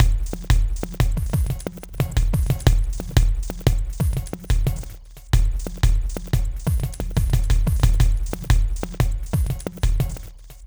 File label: Glitched, Processed Percussion